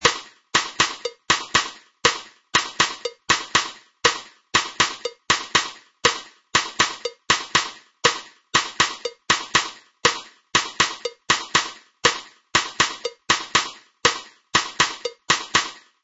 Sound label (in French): tumba francesa cinquillo appui